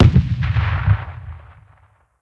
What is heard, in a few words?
Boom; blue archive